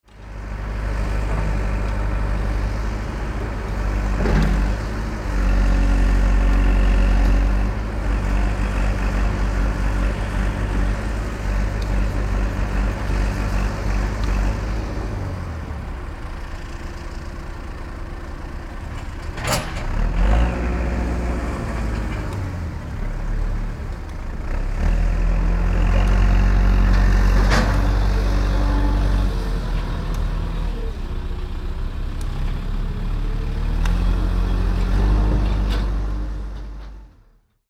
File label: digger unloading rubble